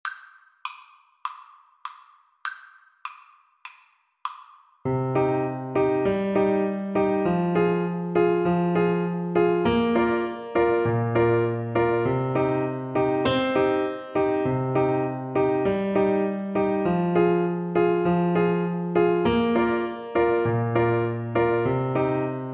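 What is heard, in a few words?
horses alto sax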